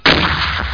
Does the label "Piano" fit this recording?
No